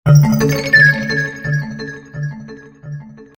Flute; Alcatel; OneTouch-S'Pop-4030D

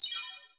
camera shutter crystal; Samsung SGH-i907 Epix

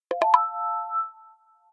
Resonance, notifications; Alcatel Vodafone Smart II

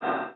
game chess unsuccesful selection; Nokia; 770 Internet Tablet

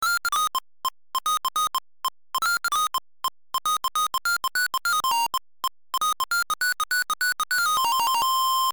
jam session; Nokia; Monophonic

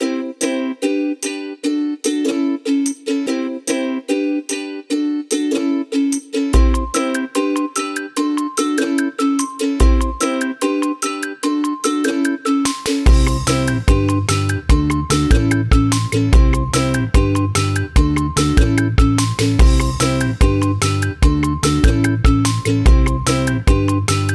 Morning Melody; China Telecom; alarms

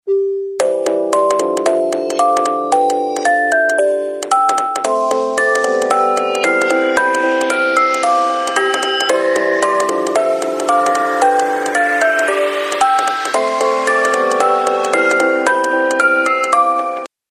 Rain Drops, phone tones; LG JN240 Remarq

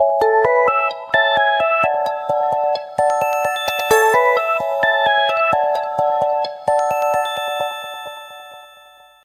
Digital, ringtones

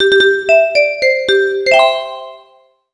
sms, Samsung SCH-M490; Samsung SCH-M490 T Omnia

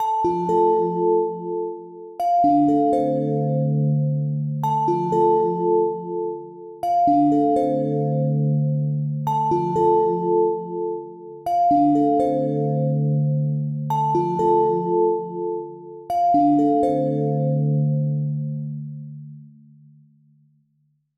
Healing Bells, alarms; HMD Skyline India

